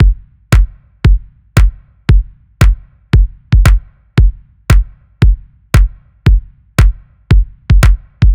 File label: Noisy Set B, electro-pop